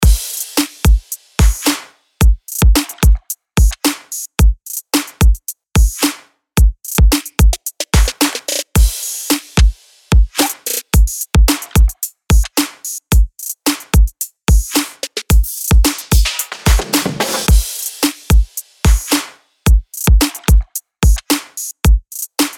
DRUM LOOP BREAK BEAT 110BPM

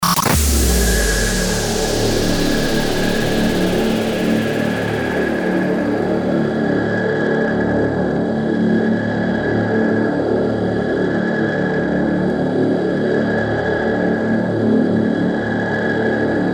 DRONE 87BPM Dmaj

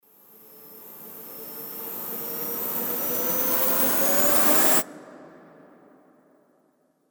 RISER

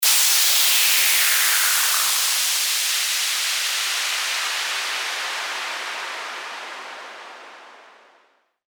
WHOOSH

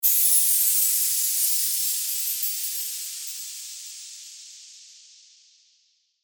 CHIMED WHOOSH

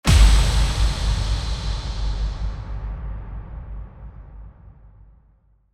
IMPACT

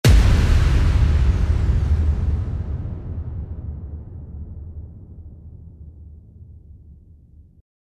IMPACT

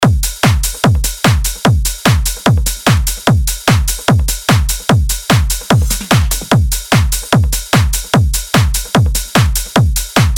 DRUM LOOP TRANCE 148BPM

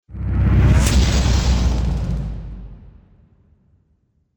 IMPACT

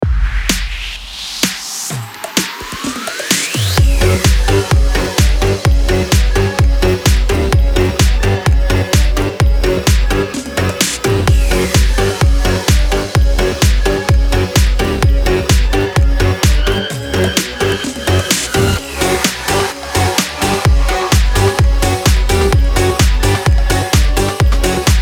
BED HALLOWEEN EDM FMIN 128BPM

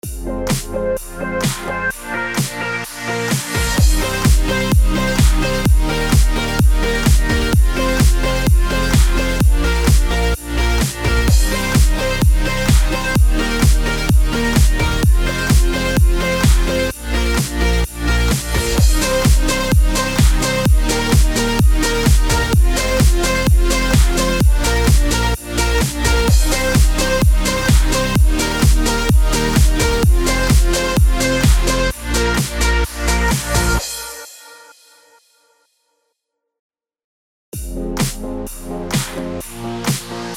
ELECTRO HOUSE CMIN 128BPM; BED

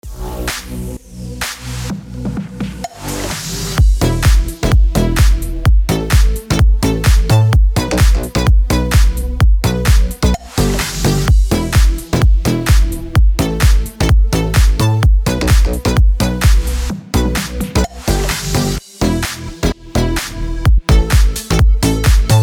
BED SLAP HOUSE EMIN 128BPM

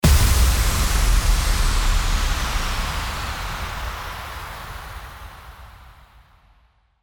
IMPACT

